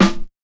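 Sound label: snare, drum kits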